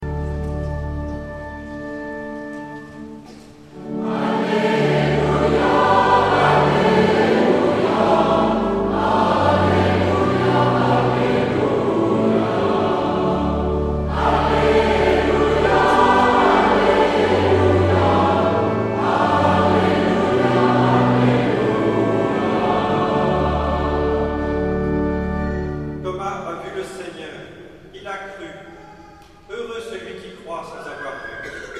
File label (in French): Tutti; Alleluia St Boniface